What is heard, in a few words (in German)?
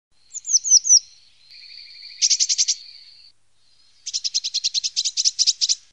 blaumeise